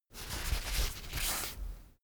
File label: toilet paper sheet handle fold, badezimmer; haus-garten